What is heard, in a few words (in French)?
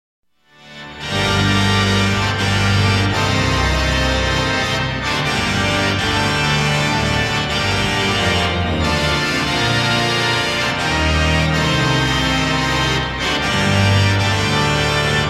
la chaconne en sol majeur